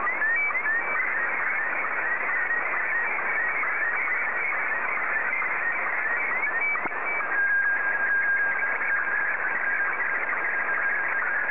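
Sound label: SSTV, Martin 1; KK4WJF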